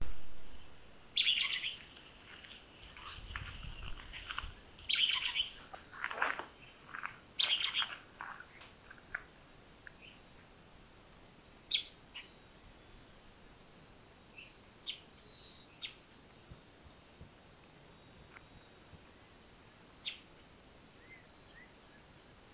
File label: Lesser elaenia; پرنده ابروزردک کوچک